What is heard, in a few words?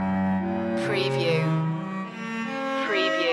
baroque loop; Sad / Melancholic